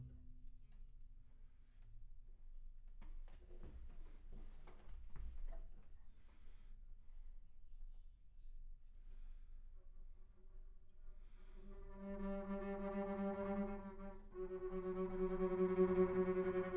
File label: Bass Room